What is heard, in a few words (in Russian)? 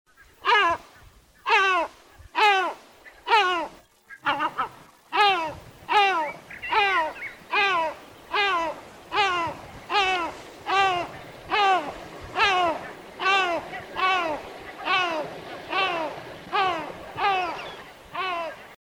bespokojnyj krik morskoj chajki